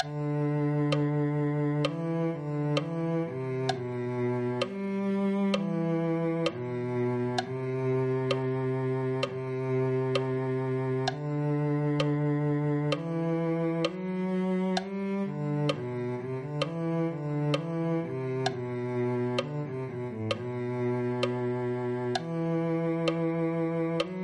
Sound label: cello